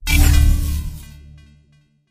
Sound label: space chip fail